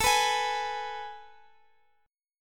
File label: A Major 7th Suspended 2nd, Guitar, Open F; AM7sus2; strummed; x,x,x,16,14,15